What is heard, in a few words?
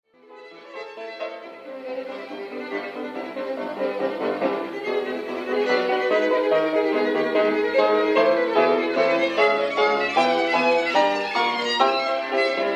violin